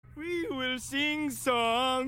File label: Sing songs